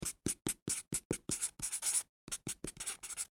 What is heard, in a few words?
Office Marker Write On Cardboard Fast; Writing; Human